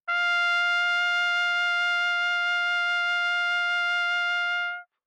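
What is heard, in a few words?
Trumpet vibrato ff F4 stereo, Bb Trumpet; MIS Pitches - 2014; Brass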